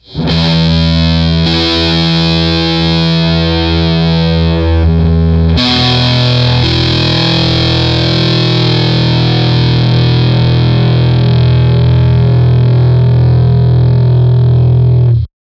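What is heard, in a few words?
Fuzztest guitar ultrafuzz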